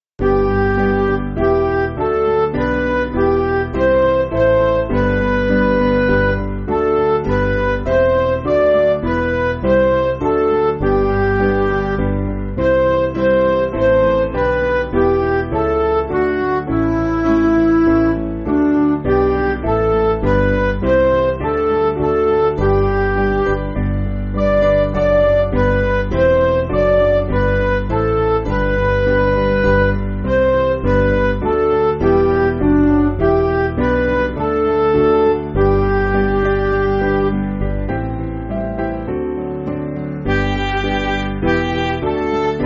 Midi

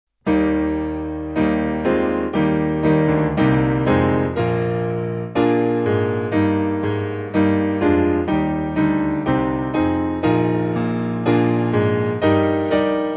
Piano; All Creatures; Lasst Erfreuen

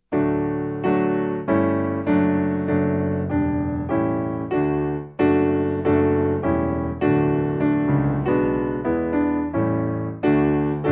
Piano

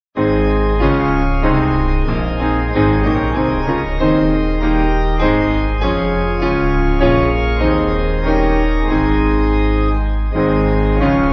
Pipe Piano

